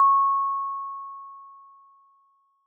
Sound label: resonant tick